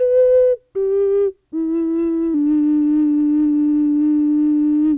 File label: Ocarina Loop